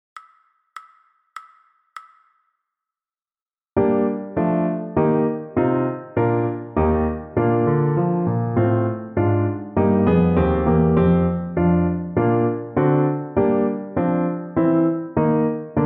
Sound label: piano